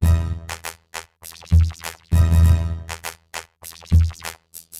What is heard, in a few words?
Robo Beat 100BPM, sci-fi Beats